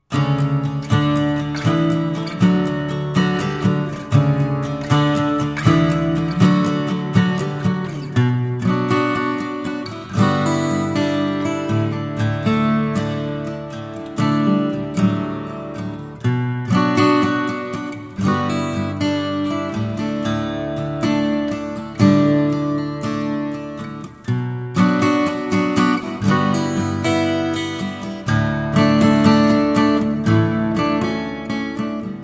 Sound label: guitarist